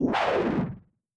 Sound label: beast roar more savage